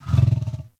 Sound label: interact sleep affection groan, Kubrow; Enemies; Warframe; Tame